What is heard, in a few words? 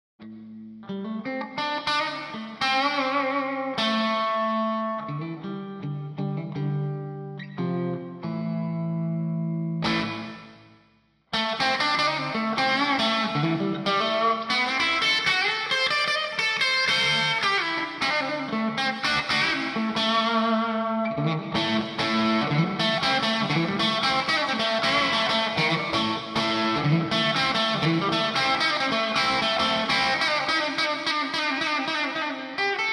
bridge, strat